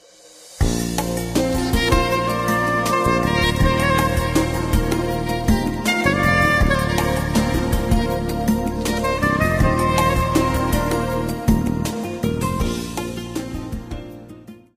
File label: soprano sax